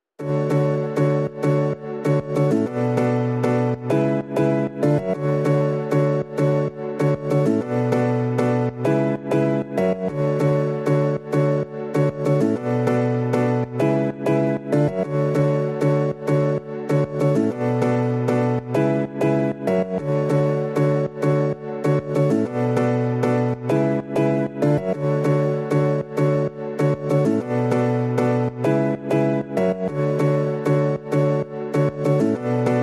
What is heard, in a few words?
Piano Only